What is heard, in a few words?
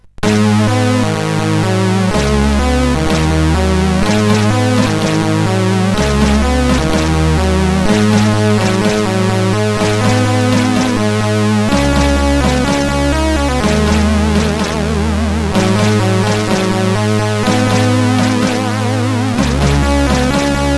Sound, sid CSG8580R5